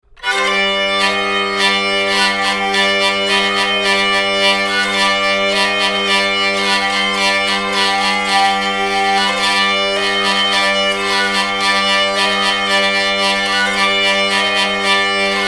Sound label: hurdy